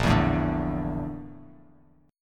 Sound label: Bb Major 7th Flat 5th down Bass Standard, chord; BbM7b5 strummed; JGuitar